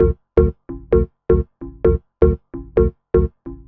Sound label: Synth, Techno